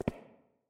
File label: player walk floor